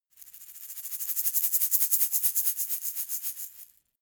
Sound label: shaker plastic egg rattle dynamic